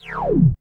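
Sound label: reverse sweep; Back In Time Records; Big Bang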